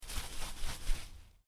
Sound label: Spray Can: Shake; Spraying Sound Effects; Spraying (Sound Effects:Objects); Stuart Duffield